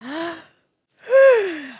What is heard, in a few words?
yawn; emotes